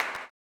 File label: CLAP, Blues Soul; Spectrasonics Vocal Planet